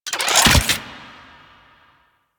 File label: attach; Warframe; Sharkwing; Vehicles